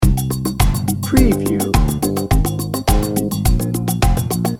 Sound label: latin carnival loop